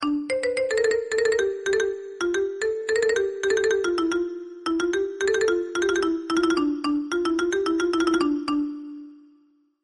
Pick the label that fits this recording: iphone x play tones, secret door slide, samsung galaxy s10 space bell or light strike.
iphone x play tones